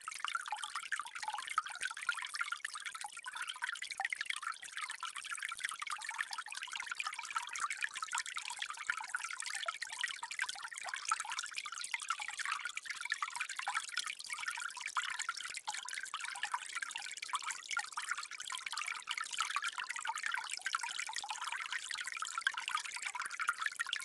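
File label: water flow trickle gurgling in forest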